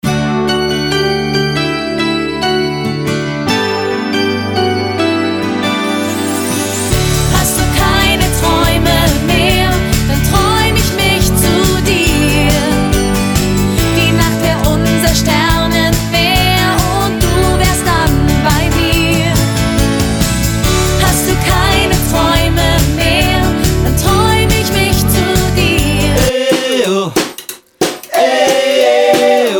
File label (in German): Gesangsmedley